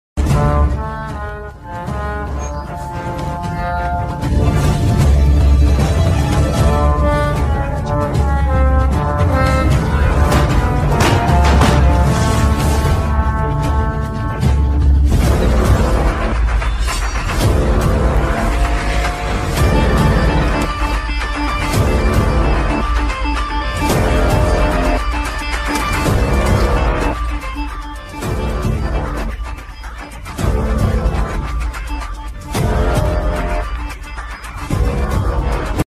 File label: Bgm Ringtone; Pushpa 2; where is Pushpa; Allu Arjun; tiger